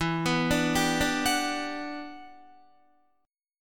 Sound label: E Minor 9th, down, Guitar; Em9 arpeggiated